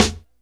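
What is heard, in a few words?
smooth snare drum sample a key, tuned to the A note; Mono; snare-drum-samples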